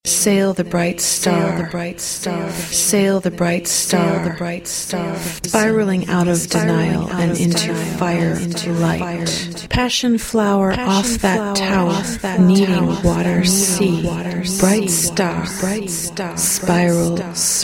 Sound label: echo center within bright star echo